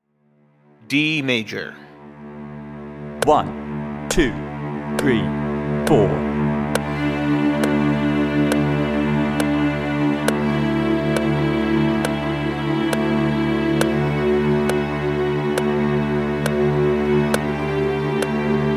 D Major Scale with Drone; Automate and Motivate 21st Century String Players with Technology

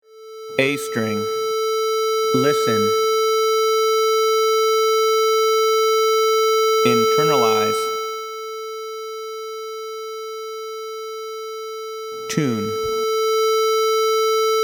A String Tuning Sequence; Automate and Motivate 21st Century String Players with Technology

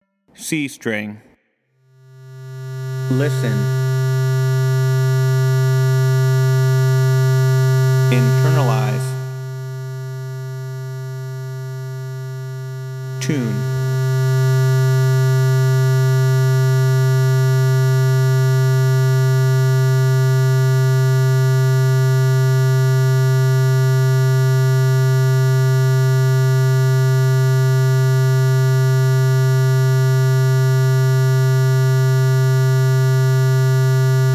C String Tuning Sequence; Automate and Motivate 21st Century String Players with Technology

